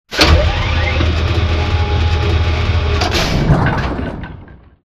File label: conveyor close; corporate; spectre conveyor; Titanfall 2